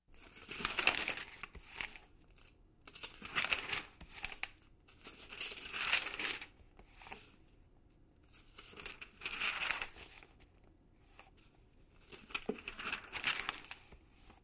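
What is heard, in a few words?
pages being turned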